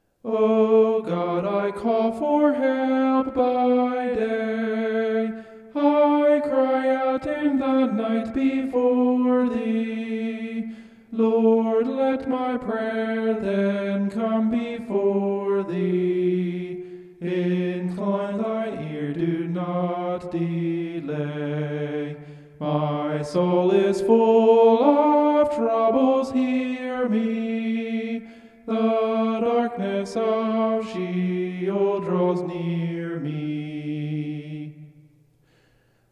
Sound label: vocal homoph; psalm 88